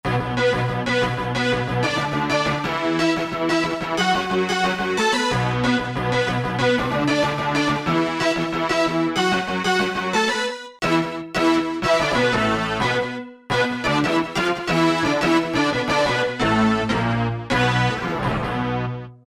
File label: Orch Hit, Korg MSC-2S Synth; Synth 1